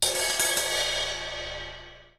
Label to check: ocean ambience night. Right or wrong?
Wrong